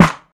studio snare sound E key, Royality free acoustic snare tuned to the E note